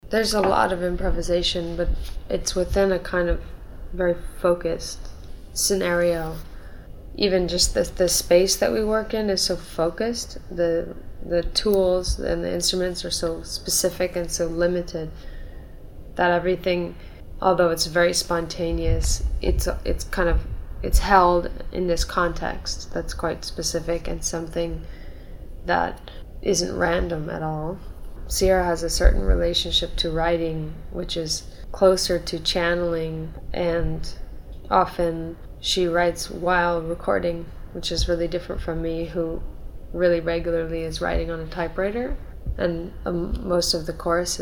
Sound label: Improvisation